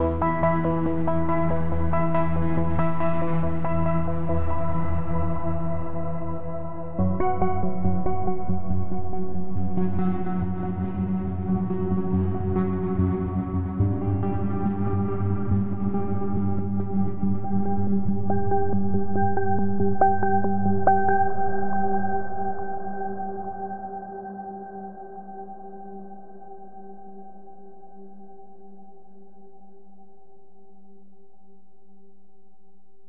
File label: ambient, space, interlude; factorio